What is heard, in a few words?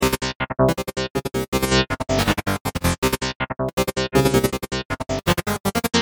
TranceGate; Doofers; Thebellows